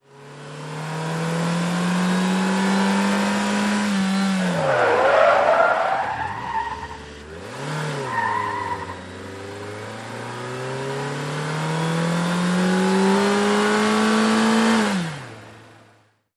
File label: Sm Car 180 Rev Skid, compact